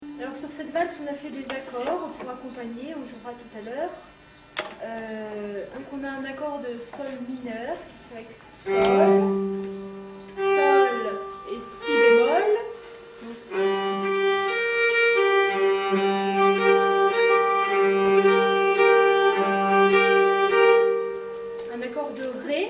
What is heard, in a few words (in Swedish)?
stulenvals acc, nyckelharpa